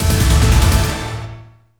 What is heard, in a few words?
Common Battle